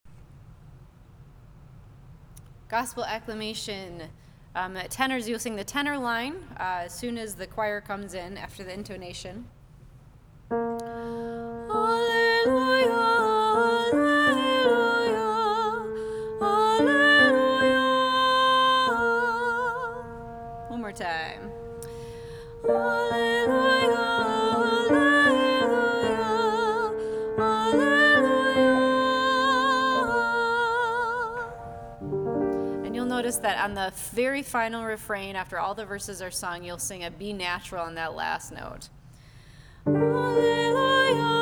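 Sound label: Gospel Acclamation Easter tenor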